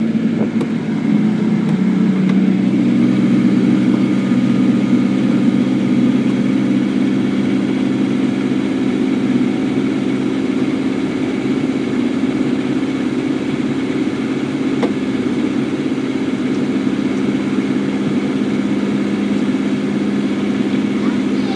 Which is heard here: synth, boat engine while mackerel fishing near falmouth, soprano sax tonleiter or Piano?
boat engine while mackerel fishing near falmouth